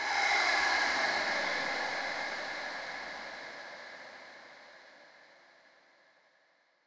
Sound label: 115 A Love Kings Fx